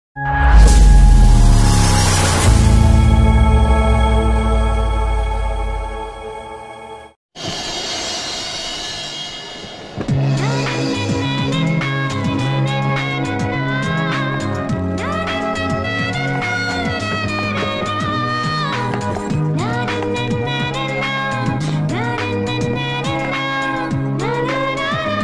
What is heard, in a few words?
odia love ringtone odia sad ringtone odia bgm ringtone; odia movie ringtone